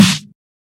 clear spin; quatuor

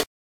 garbage out small; quatuor

